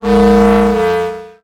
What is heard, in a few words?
Transition Feedback Sweep; Production Element